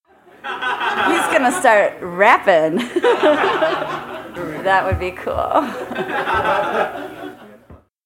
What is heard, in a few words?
RAPPIN